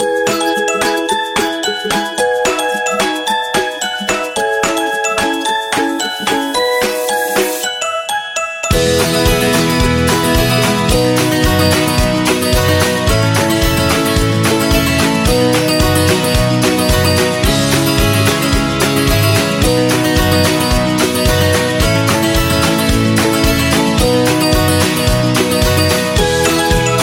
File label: Silly Comedy, ukulele